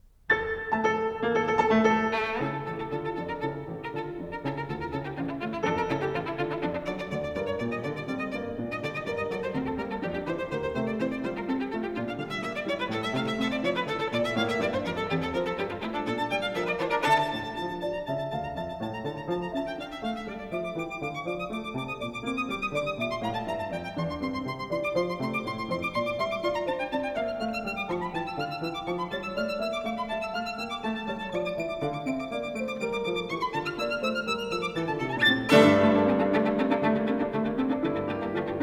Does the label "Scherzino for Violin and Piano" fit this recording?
Yes